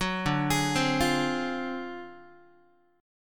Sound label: D 7th Sharp 9th Flat 5th D down Guitar; D7#9b5 arpeggiated; JGuitar